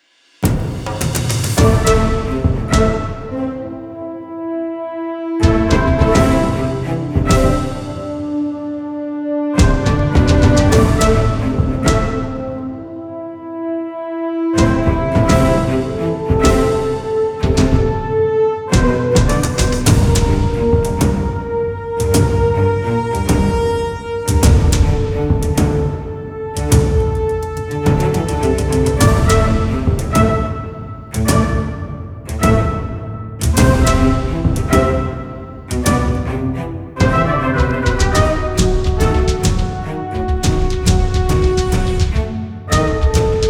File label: Mellow Game